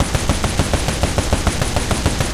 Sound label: loop oerlikon